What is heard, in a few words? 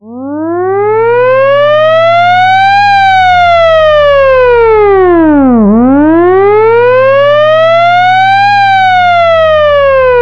air raid mechanical siren